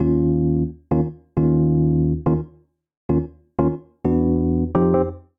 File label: Eb minor 88bpm; MIDI Clips; Accompaniment; Rcrd Plnt; Ableton; Factory Packs; Chop and Swing